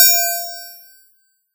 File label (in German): Pling